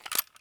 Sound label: metal use; magazine; Escape from Tarkov